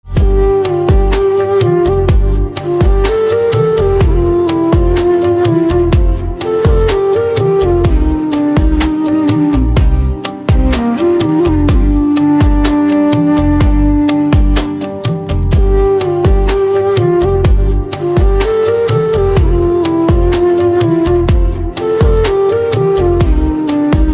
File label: ringtone piano